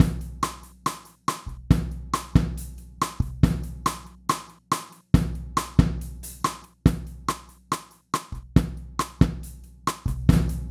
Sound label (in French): batterie sd2 transient presets; cakewalk; Sonar 8 Studio